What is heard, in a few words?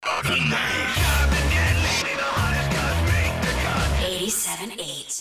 The Knife 87.8 Jingle; Gangstar Vegas; Android